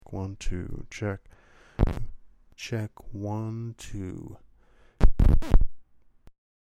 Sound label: glitch, bad microphone